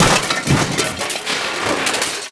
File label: tumble; Learn to Program Basic Review Copy (Interplay)(June 23, 1998)